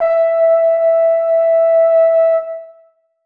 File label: horn e5; Sonatina Symphonic Orchestra